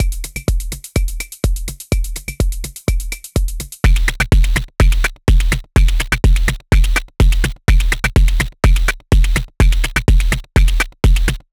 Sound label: Technoloop SEM Filter Mini Filter Audio morphing